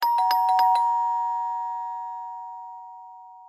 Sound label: Ding Dong; PomoLuxe